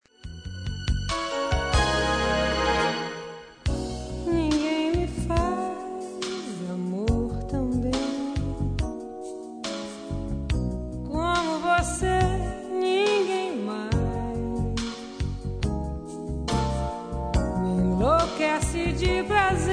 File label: vocal, chanteuses